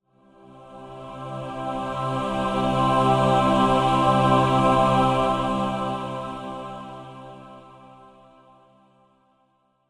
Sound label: musical heavenly choir